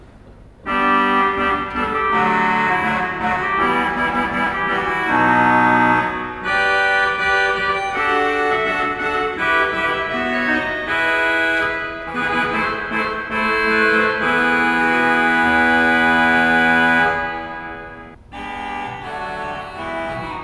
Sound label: Dulcian and Regal